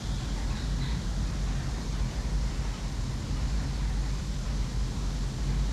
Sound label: Ambience Loop, outdoor